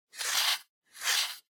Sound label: Outlet Timer Dial; タイマー機器